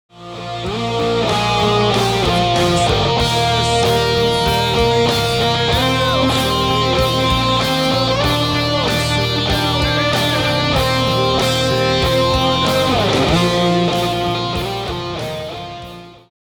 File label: Boosted Lead Guitar